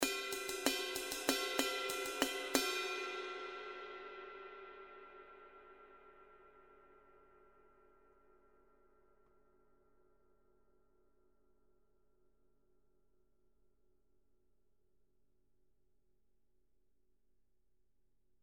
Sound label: zildjian